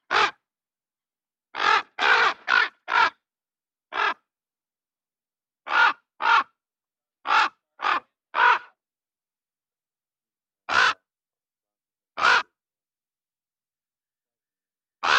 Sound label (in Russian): voron